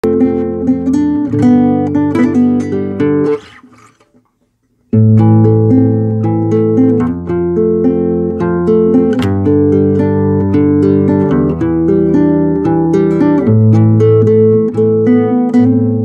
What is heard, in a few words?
turkmen gitara